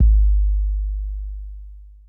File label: Kick Hit; big fish audio; Hip Hop Exotica; Cmin